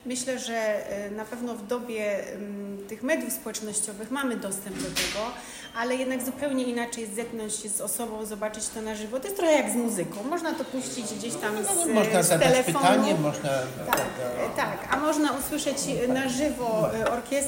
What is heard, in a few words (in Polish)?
dzwiek swist; Opolski Festiwal Podróżniczy